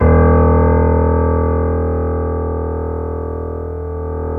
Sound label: C7 PP, The Ultimate Piano Collection; East West